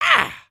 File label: Attack, creatures organic